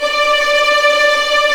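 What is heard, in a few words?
D5 tremolo, String; East West; The Ultimate String Collection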